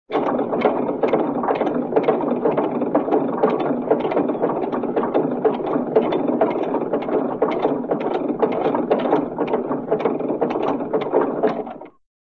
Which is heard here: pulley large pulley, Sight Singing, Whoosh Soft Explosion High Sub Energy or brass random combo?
pulley large pulley